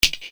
Percussion, EuroDance; Best Service; Ethnic Journey Sound Cube; EuroDance Essentials

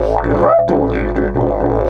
130, Didgeridoo

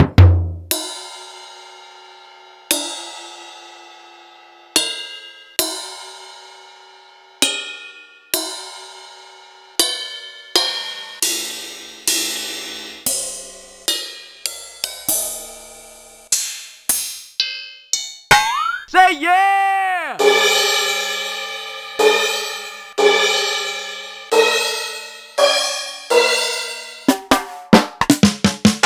Millenium MPS-4001

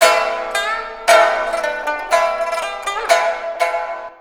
PIPA LP, PIPA LOOP; USB Soundscan vol.60; Asian Traditions; AKAI

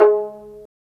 Pizz, String; Roland - String Master Series

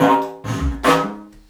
Didgeridoo Kit Samples

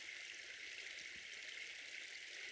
Waterway E Light

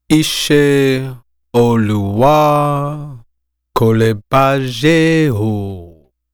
African Lead Vocal; Continental Drift Aftershocks; Vocals

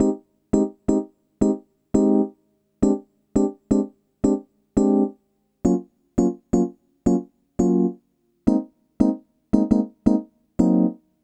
Rhodes

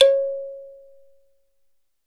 THUMB PI, Kalimbas; E-MU Producer Series Vol. 7 – Old World Instruments

